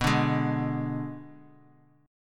B Minor 6th B, Bass Standard; Bm6 strummed; x,14,12,13; JGuitar

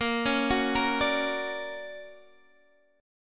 D Augmented Major 7th Bb, guitar

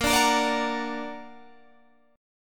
Bb Augmented Bb, Guitar Open G; 20,19,20,19,19,20

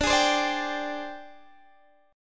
Ab Major 7th Flat 5th D, Guitar

